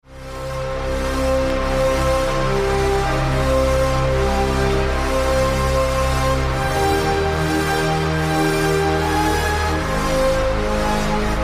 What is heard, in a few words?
remix